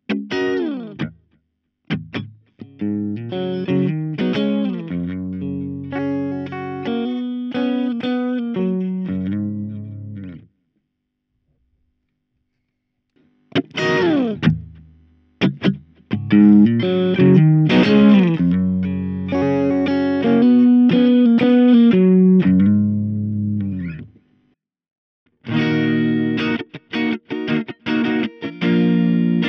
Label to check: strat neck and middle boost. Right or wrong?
Right